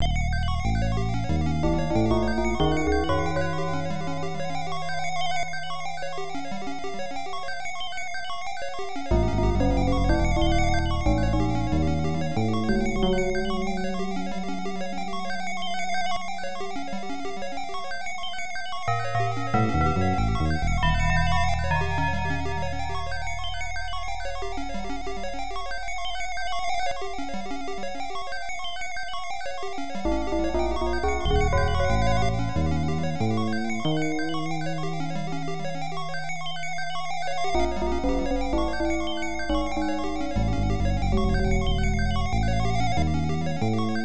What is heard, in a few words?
Strange Ambience; madou monogatari ars; pc-9801